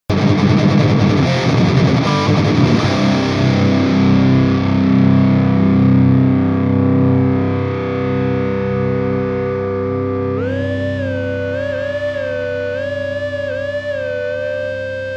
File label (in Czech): bublina